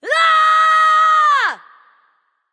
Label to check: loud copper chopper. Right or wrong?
Wrong